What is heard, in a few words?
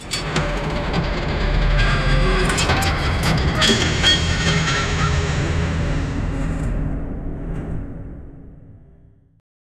heavy mechanical transformation